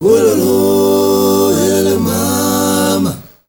MALE, CHOIRS; Spectrasonics; Heart of Africa 2

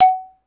XYLO; Roland - LA Composer vol. 1

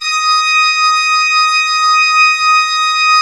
D5, Cathedral Organ; ILIO; Propeller Islands Cathedral Organ

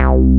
BAS; Best Service Gigapack 2

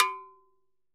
Timbaleta Cowbell; Best Service; Festa Latina VOL-1; Single-Hits

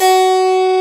HARPSICH

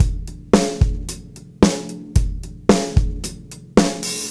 Beat Loops; Zero-G; Monster Beats

